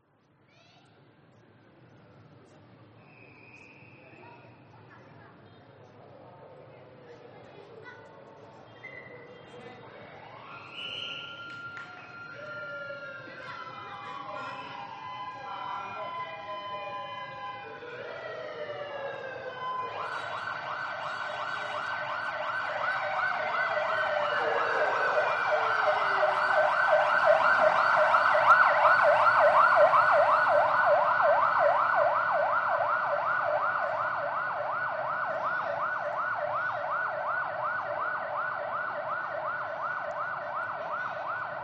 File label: police sirens convoy escort police cars and motorcycles president motorcade passing; vietnam